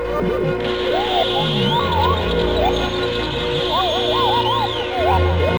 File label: Sine